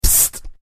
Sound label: psst; doors